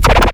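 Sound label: Boss DR-202 percussion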